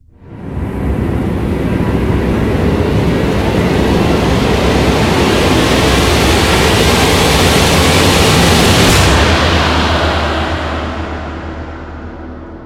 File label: raiser; asgardianstones